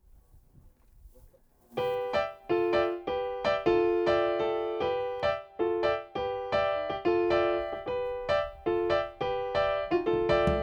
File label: taste of jazz 1st set